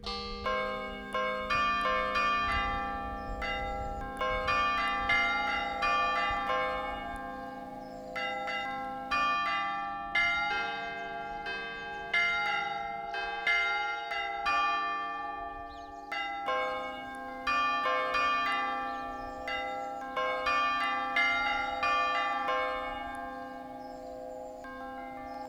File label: carillon; steanne